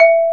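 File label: xylophone E-3; AKAI S6000 CD-ROM - Volume 1; Piano; MALLETS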